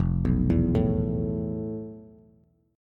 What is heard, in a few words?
F Major 7th Flat 5th Bass; arpeggiated; JGuitar